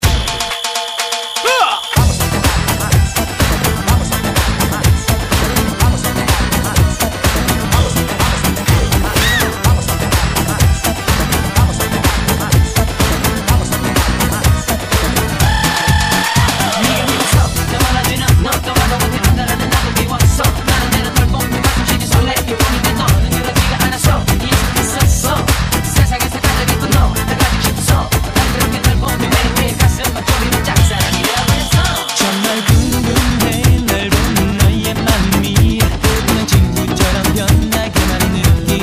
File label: Techno Remix